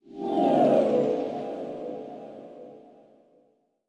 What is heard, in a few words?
BERZERK CAST